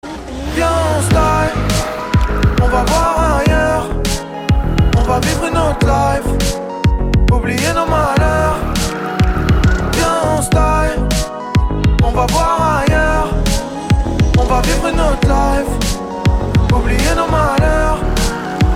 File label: ringtone